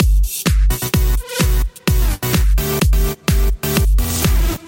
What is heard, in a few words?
nice beats